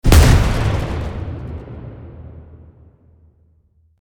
Pirate ship cannon single fire

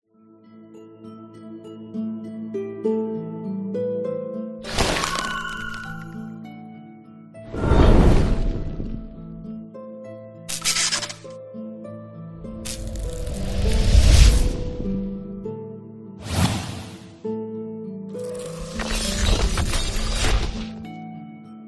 elemental magic

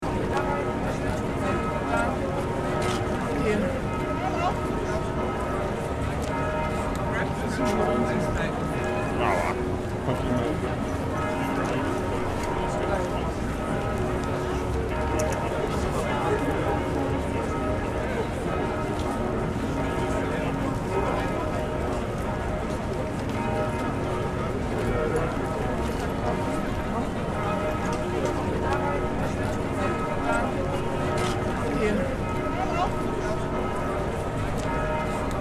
City street crowd noise urban ambience

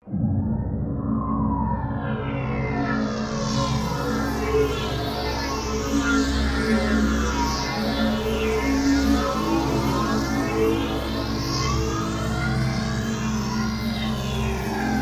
Ambient sci fi drone

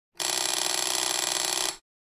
Common electric doorbell

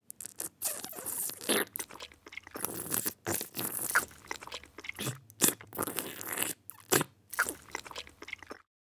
Eating soup light slurping and drinking

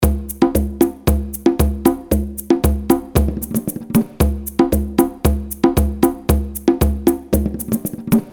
Congas loop

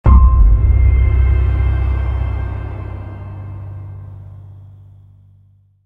Dark cinematic suspense riser horror

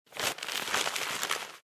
Hand crushing a paper bag

